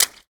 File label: Short foley